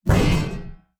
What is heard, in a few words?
RoyalGuard Attack